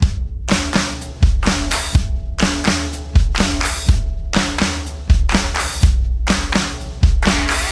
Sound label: 124.5 bpm c punky; drum loops